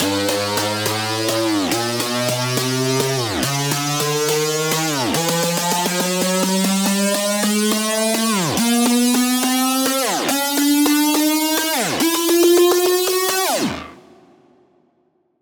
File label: Tension Riser, 140 BPM G; Risers; Cymatics